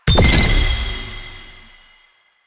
Gunbrella Alt Fire Notifier, Weapons; Warframe; Tenno; Koumei

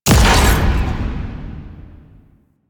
Explosion; Immunode; Warframe; Veilbreaker; Devourer Kahl

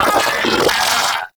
Arachnoid Vocal Pain; Enemies; Vocals; Warframe; Corpus; Arachnoid Hunger

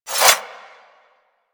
Unequip Dual Daggers; Warframe; Weapons

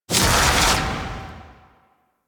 Queens Chamber Queen Blast; Ambience; Warframe; The War Within

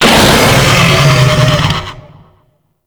Infested Bone Blade Vocal Death; Warframe

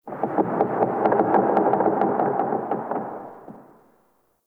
Ancestor Tree Wood Creak; Soul Plane

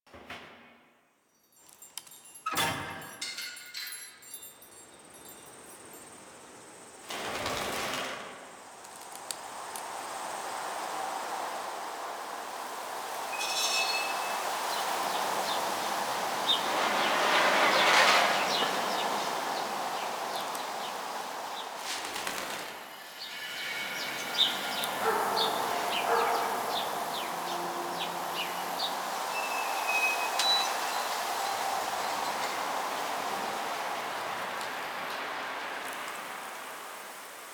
Summer Exterior Large Bed Loop; Warframe; Nineteen Ninety Nine; Ambience

